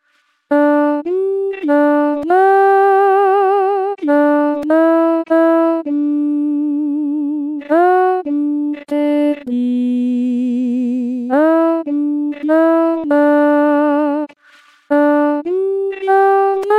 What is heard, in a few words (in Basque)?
agur jaunak alto